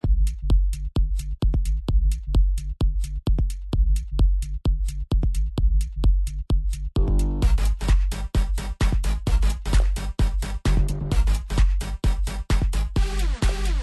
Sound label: percussion; virtual dj